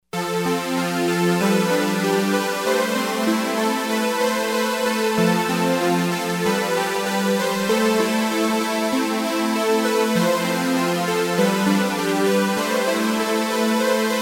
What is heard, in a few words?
Euphonic, Novation XioSynth 25